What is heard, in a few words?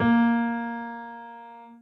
soft hitwhistle